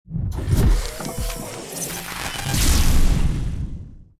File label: Hands Effect; Starbites; Makobo